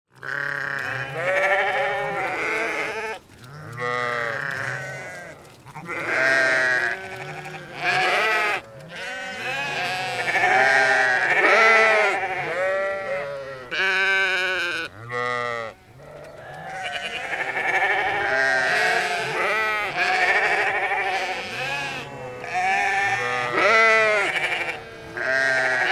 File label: Sheep Ringtone